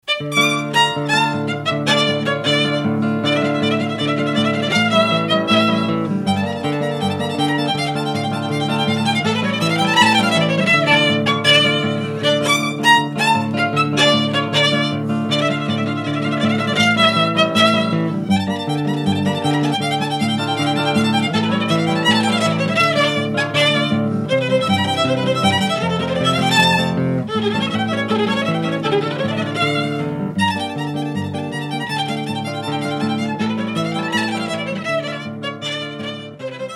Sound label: Gtr Vln